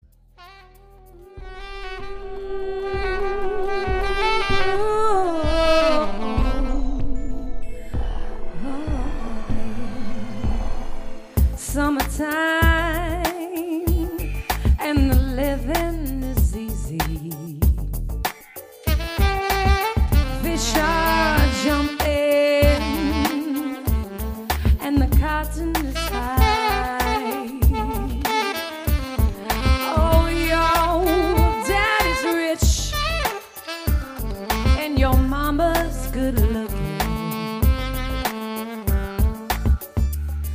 R And B duo sample, Funk

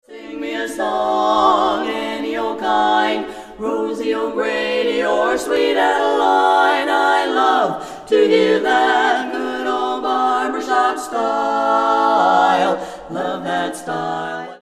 Barbershop Style, a cappella group

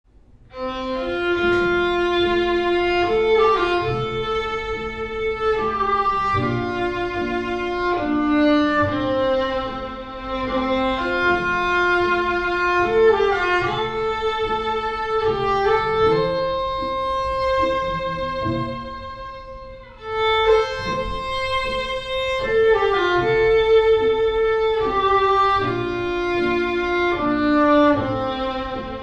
Violin Soloist; jazzband; Amazing Grace

